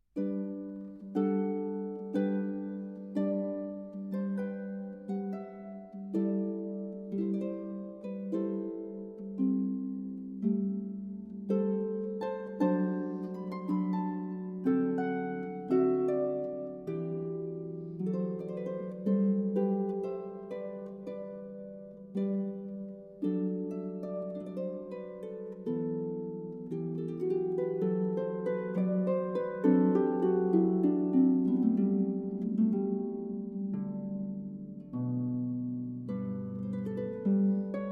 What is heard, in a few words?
Harp Sonata in G Major I. Adagio un Poco